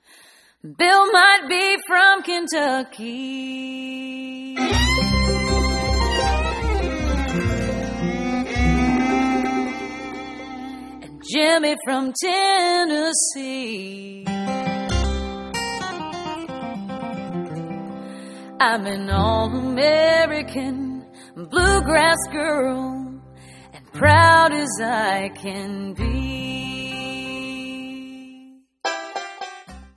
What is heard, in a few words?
bluegrass